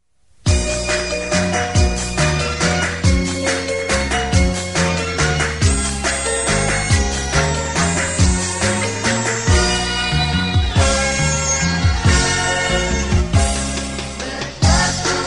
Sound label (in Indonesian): Tari Tepuk Tangan; Lagu Anak